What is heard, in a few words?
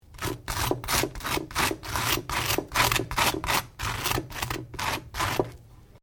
TOAST BUTTER; Various food preparation; Household